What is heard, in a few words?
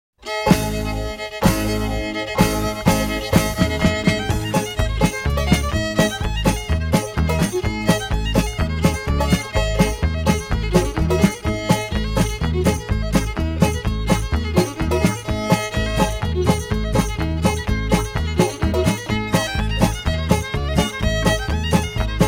instrumental; cripple creek